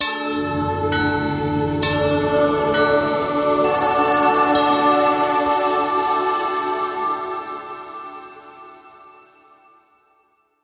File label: Light Sting